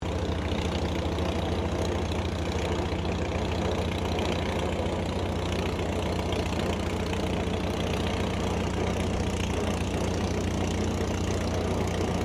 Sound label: AIRCRAFT DH82A DEHAVILAND TIGERMOTH TAXI SHUTDOWN; RAAF Tigermoth; Airplanes; Vehicles